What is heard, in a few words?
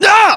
pain; english